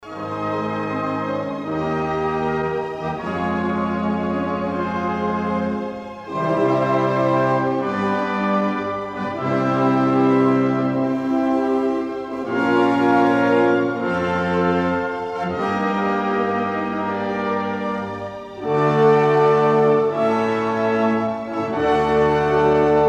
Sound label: Chorale; Jochum